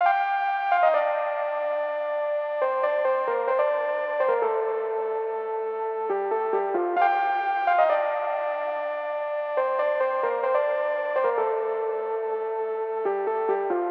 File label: 138 BPM C Min MemoryMoog Lead; Loops; Loop Stems; Cymatics; Fantasy Synth Sample Pack; Afterlife